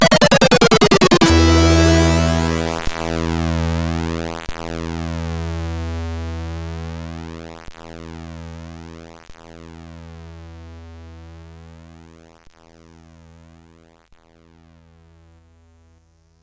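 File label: Rockit, MOS 8580 SID chip music, games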